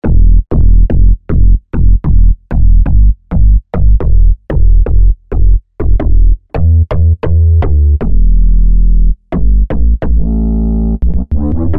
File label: Deep Round Synth Bass; Apple GarageBand 2